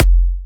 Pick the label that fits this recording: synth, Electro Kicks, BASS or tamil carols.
Electro Kicks